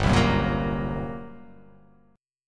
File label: G Minor Major 7th Double Flat 5th down, chord; strummed; JGuitar